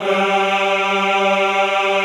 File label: EHH G2, Classical Choir; Best Service